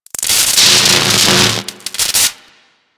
Ocean Electric Surge Large; Warframe; Grineer Ocean

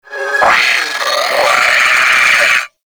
Sentient Attack, Barks; Warframe; Sentients; Brachiolyst

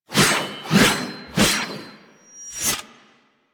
Sword Swipes Close; The New War; Lua Valley Ext

